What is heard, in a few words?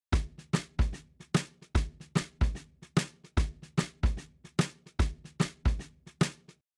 Drums, shuffle drums; WDR2